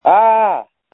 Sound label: Scream